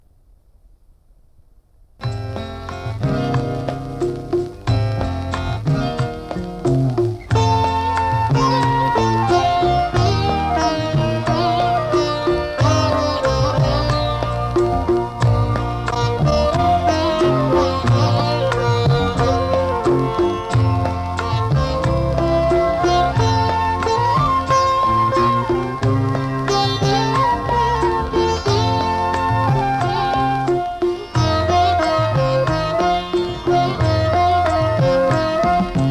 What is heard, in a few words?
Music; Agni Pareeksha